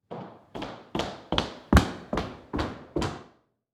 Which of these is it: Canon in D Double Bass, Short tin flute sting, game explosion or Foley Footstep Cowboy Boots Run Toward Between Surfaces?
Foley Footstep Cowboy Boots Run Toward Between Surfaces